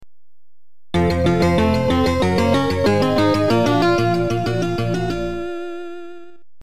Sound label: level completed; gem-x; amiga